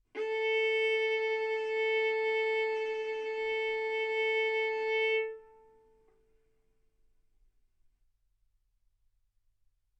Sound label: Violin arco mf sul A A4 B4 stereo